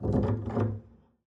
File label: pizz noise strum long, double bass; dsmolken